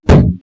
met strk, bugs; Starship Troopers

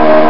Motor; skidmarks ii

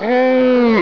lose

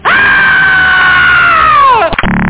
aaaahh; Amiga CD32 CDTV; blackjack trainer

